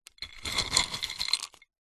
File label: food savory snack wasabi peas in ceramic bowl hand in movements; zapsplat